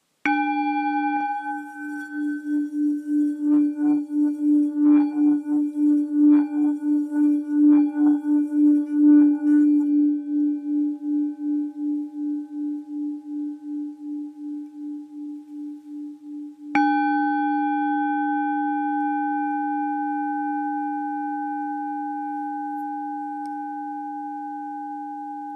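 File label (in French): Bol bengale 5-600g sol dièse, Bol chantant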